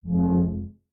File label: goblin Lose; Keepsake; puzzles; puzzle Minotaur Maze